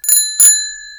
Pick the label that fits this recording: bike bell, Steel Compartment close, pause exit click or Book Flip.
bike bell